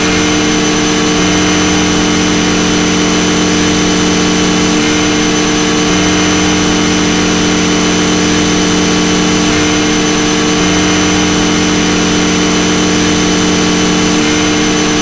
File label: in PMED, F1; Italy; AOS F1 2022